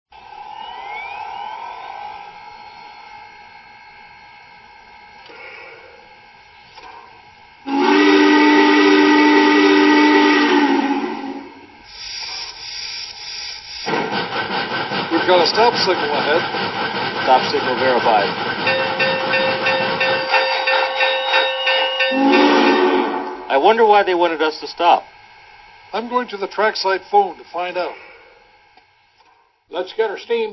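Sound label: Challenger, trains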